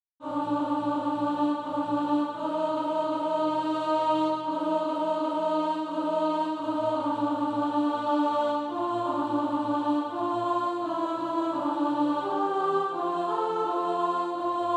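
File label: alto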